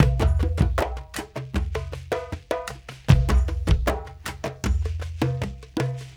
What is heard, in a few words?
Dumbek Grid, Drums; Sonic Foundry (Sony Creative Software); Friendly Fire; Marc Steve